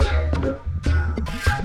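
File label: Music Dpad 145 Maxisphere; From Dust; PS3; Xbox 360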